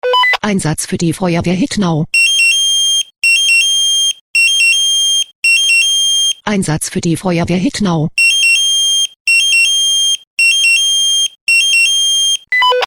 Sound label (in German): SMS Alarmierungstone